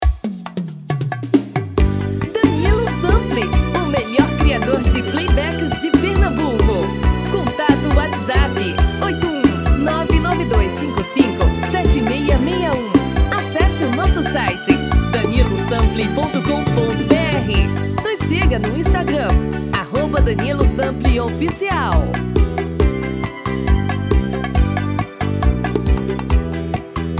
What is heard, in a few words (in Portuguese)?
ARROCHA